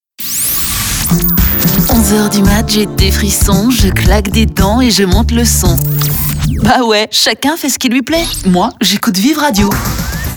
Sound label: promo chant, radio; cazaprod; viv radio